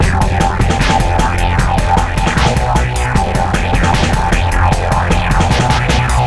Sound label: Hardcore Breakbeat, 153 Fmin; Big Fish Audio; Hardcore Breakbeat Action; Dark Star